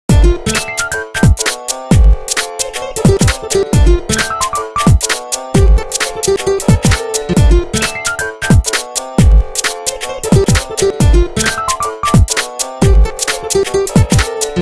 ring, 2 step; HTC S740

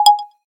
drumset etc, keytone; SKY IM-A830S-VEGA-Racer-2

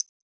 Slider Snap; Sony Ericsson; J108 Cedar

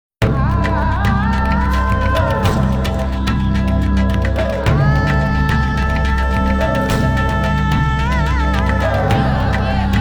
Tribal videoringtone; Nokia; Audio themes

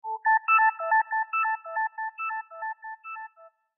Glass bell tone; Samsung; GT-B5702

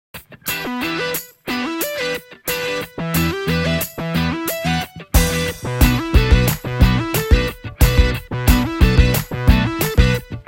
Looking Glass, Nokia Tones

